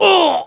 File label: Monk Aweh; Playable Tutorial Level; TR2